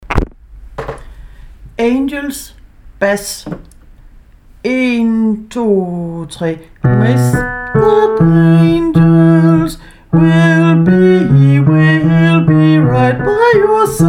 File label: angels bas